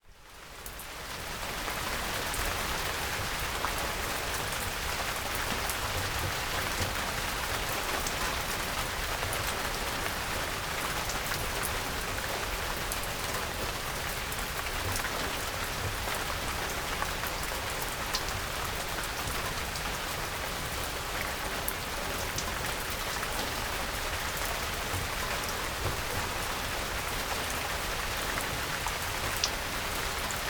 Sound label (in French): pluie matin